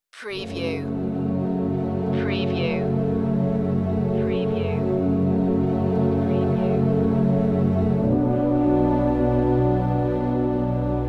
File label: strings only